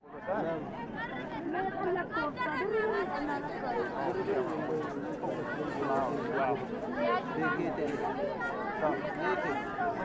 daytime etiopian food marked people bargaining chatting walla market ethiopia; sonniss gdc